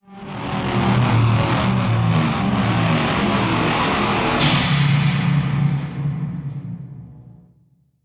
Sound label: Satellite Collision